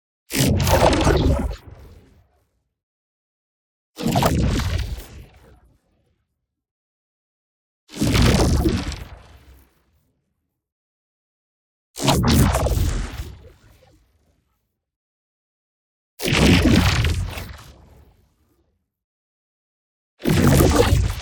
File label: ABILITY RELEASE Lightning Storm; boom library; Matter